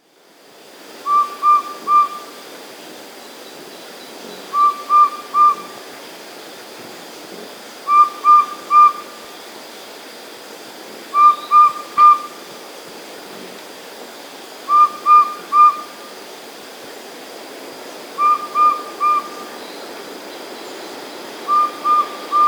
Subtropical Pygmy-Owl (Glaucidium Parkeri), Andean Birds; S. America